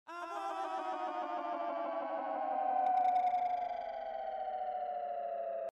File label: Vox Fallout